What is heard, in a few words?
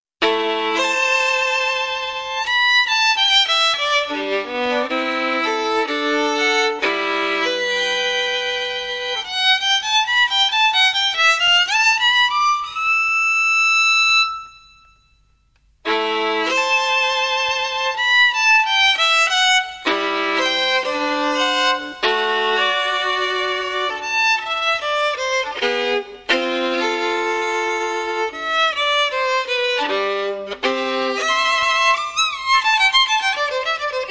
folk